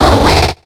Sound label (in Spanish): Grito de Munchlax; Actualizando grito en la sexta generación (XY)